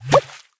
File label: Big Bubble Pop; Lagoon